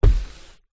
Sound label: car hand or body part hit rub ceiling roof